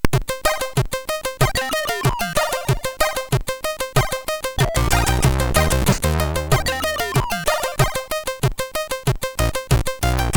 Swing it, MOS6581R3

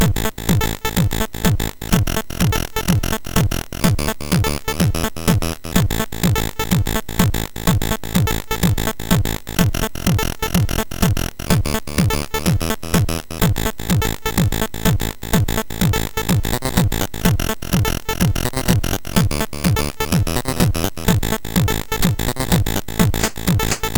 Tekkno sid MOS6581R3

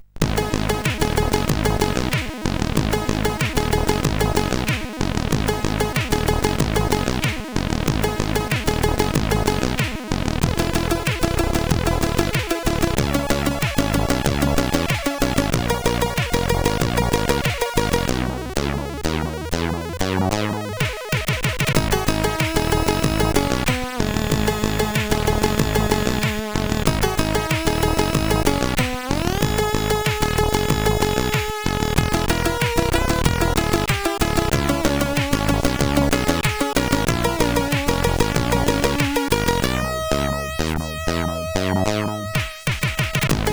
sid CSG8580R5; Innym Razem

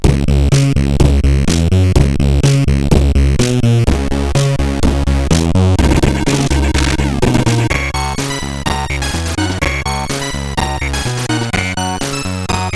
Music Mix, sid CSG8580R5; hvsc

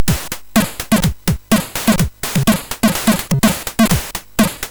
Drums

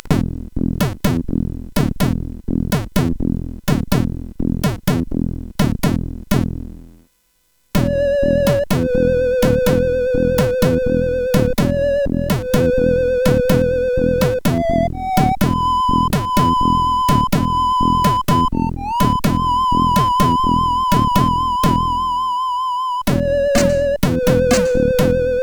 end, CSG8580R5

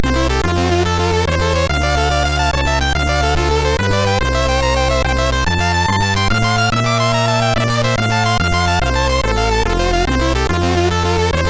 Tune sid MOS6581R2; USA

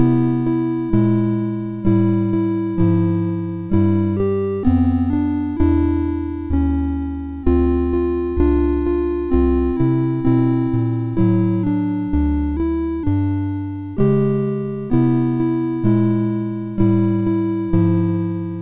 Jingle Bells, MOS6581

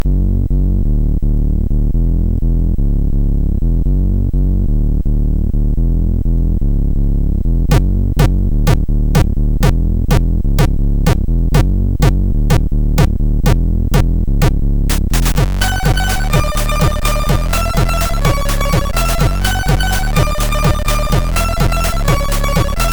Killer Techno, sid MOS6581R4; Warrior

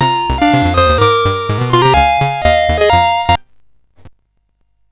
sid CSG8580R5, GAMES; Continental Circus

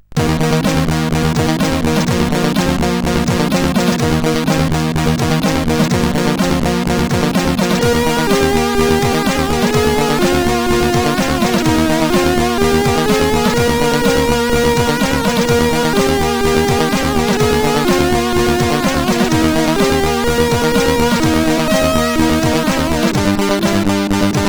Haenschen Klein, sid, CSG8580R5; Digiblaster

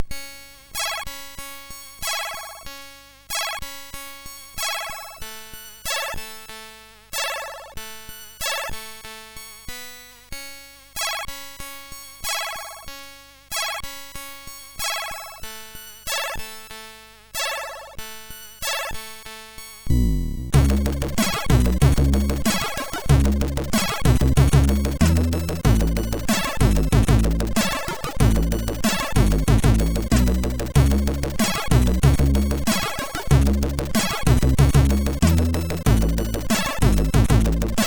Lullaby, MOS6581R4

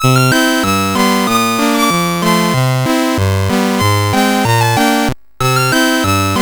Wobbler sid CSG8580R5

